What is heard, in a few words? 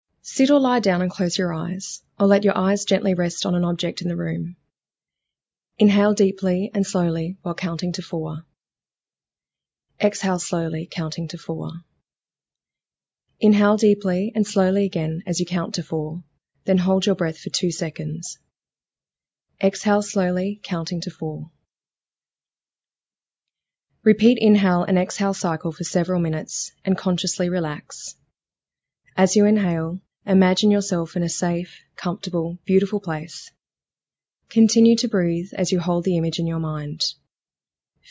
breathing relaxation